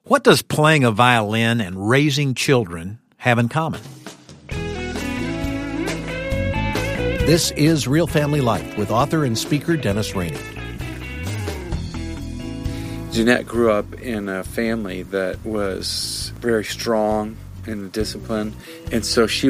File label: a violin solo